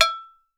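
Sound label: MD BLACK BELL, COWBELLS; Voice Crystal - Steve Reid Definitive Percussion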